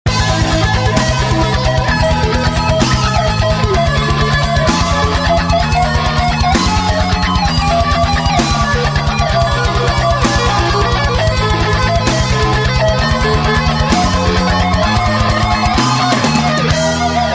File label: Viola